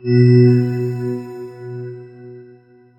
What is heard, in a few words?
soft silver shimmer swell